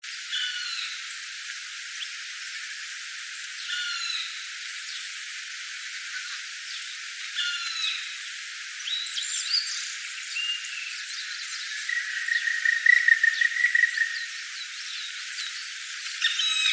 kumagera

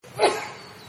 kushami

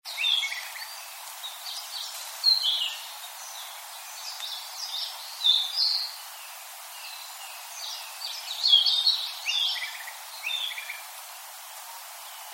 kibita

